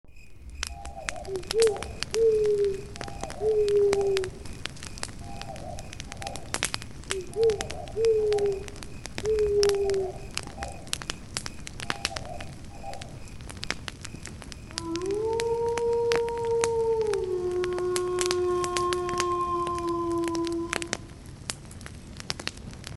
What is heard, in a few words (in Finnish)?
nuotio metsässä